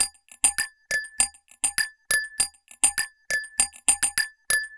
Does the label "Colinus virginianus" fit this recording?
No